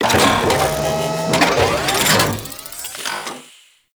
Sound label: Ship Catalogue Open; Corpus Ship